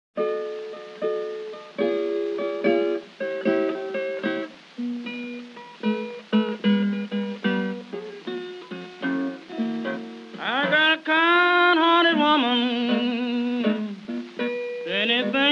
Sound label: Blues